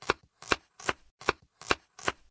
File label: Food Slicing; Zomboid 41.78.16; Project Zomboid